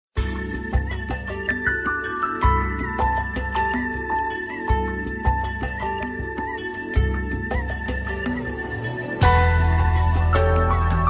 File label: newage